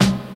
2000s hip hop snare sound g key, snare one shot tuned to the G note; snare drum samples